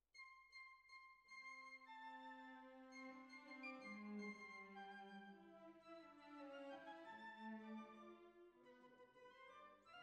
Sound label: Violins, jazz for piano